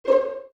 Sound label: dial tone orchestra 8; OnePlus One China